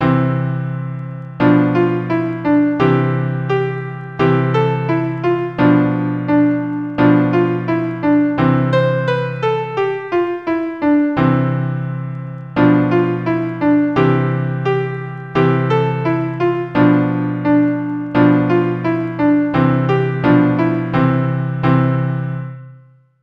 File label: The Can Can, 172 bpm